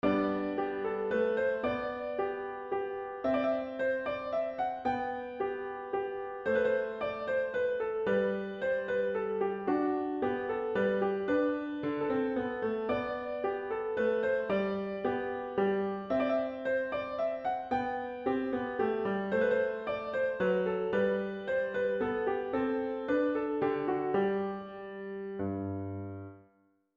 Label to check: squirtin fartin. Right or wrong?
Wrong